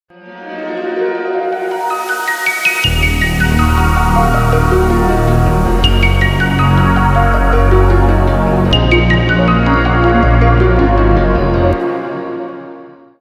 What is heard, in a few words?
intro music